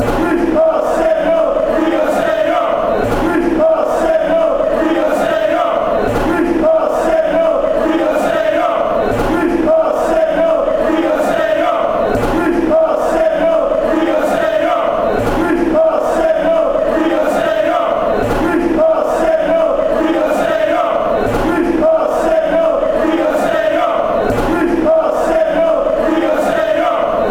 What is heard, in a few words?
we are staying; mufc